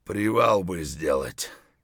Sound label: tired; Escape from Tarkov